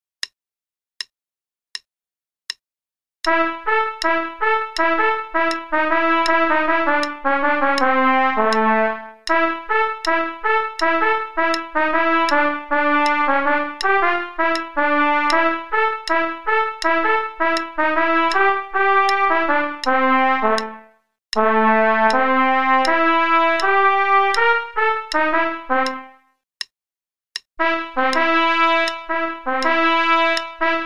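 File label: trumpet only, quarter note=80; Play-a-long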